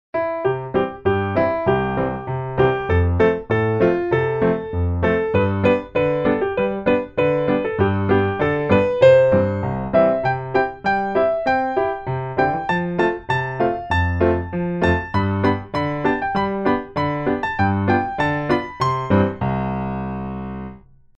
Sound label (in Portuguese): A Biblia piano